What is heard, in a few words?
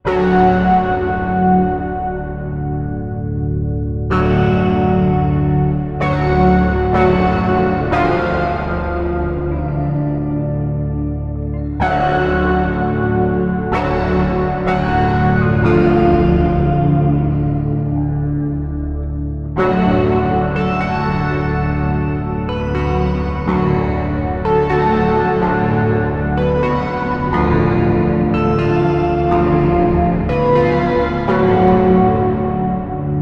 Distortion Flanger Reverb; Line 6 HX Effects